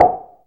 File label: NOISE BLOCK, synth perc; Ambient; Zero-G; Ambient 1